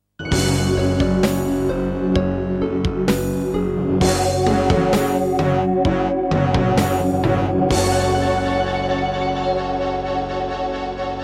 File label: Introduction, Diamond Monster Sound 0.5MB; Doom (Sigil)